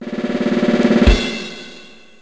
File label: Drums; LG; U8110